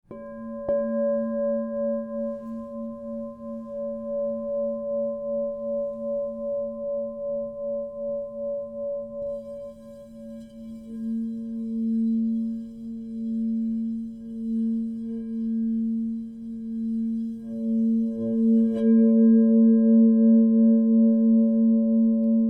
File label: Crystal Tones Singing Bowls